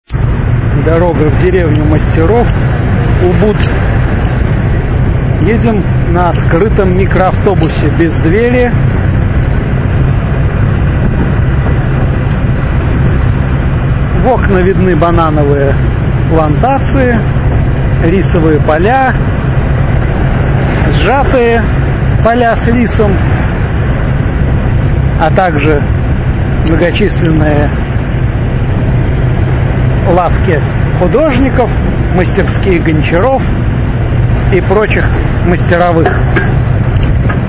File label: indonesia sounds; geopoesia